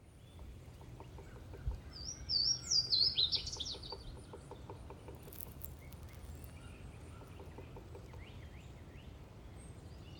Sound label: Louisiana Waterthrush